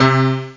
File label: piano ac mod; orchestra, keystring